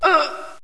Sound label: die civilian